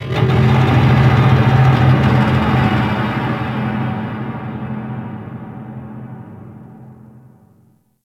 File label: Ocean Underwater Sentient Metal Creaks; Warframe; Grineer Ocean